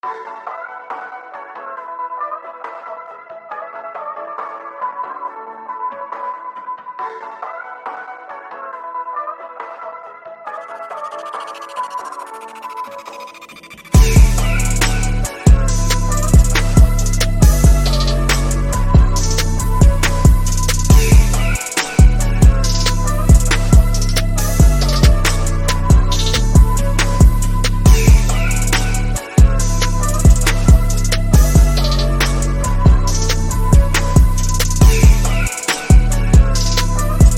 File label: Instrumental Remake